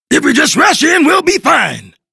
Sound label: attack; Awesomenauts